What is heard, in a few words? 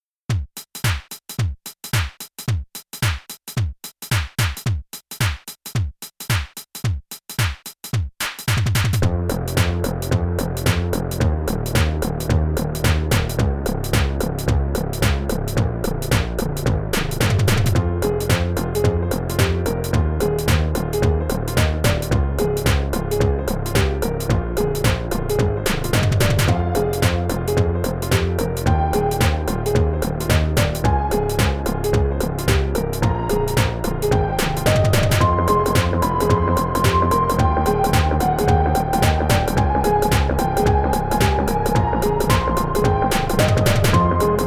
machine gun swing; weeklybeats 2024